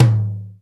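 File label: tom, 60s kits; 90 sSampleCDs; Crypton - DrumBase5000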